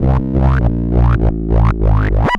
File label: Roland C Line, Roland S100; musicradar-synth-samples